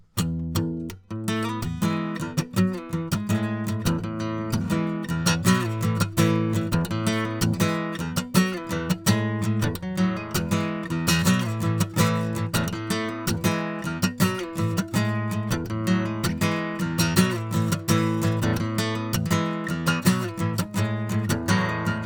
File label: Acoustic Guitar; Oktava